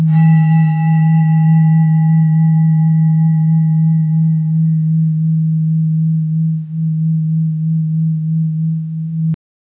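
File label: sustained tibetan singing bowl; meditative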